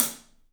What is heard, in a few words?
Paiste hat, drums; 3D Sound